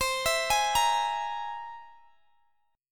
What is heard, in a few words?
C Augmented 7th, Guitar, Open G